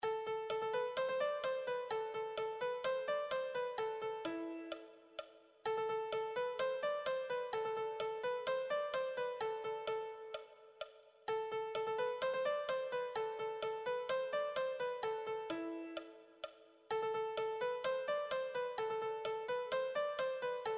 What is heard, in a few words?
melody, piano